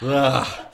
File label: ugh